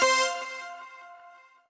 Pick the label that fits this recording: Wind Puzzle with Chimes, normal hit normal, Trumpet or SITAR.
normal hit normal